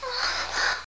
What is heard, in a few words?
MOAN